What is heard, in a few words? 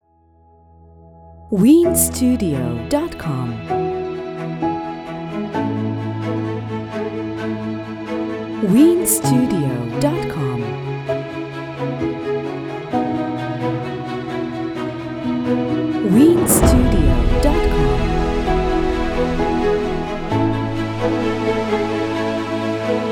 Uplifting Orchestra